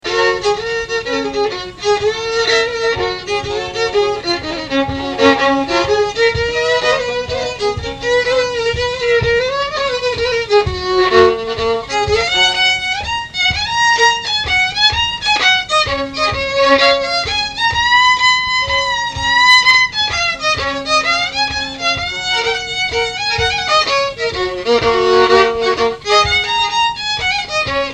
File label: Waltz